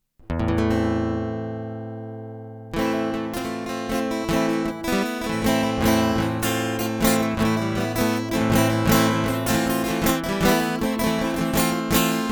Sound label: Guitar Instrumental; hyper terrestrial